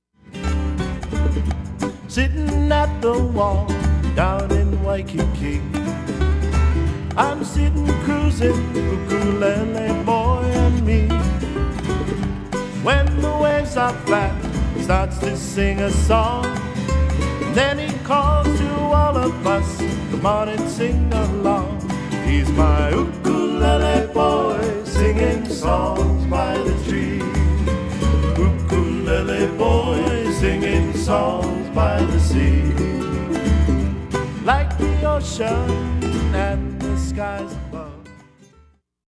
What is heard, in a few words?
Ukulele